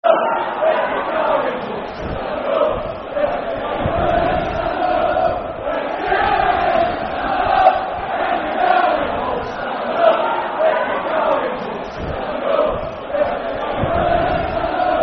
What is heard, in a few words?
Stand Up If You Are Football Chant (Soccer Song); Walsall Wfc; fanchants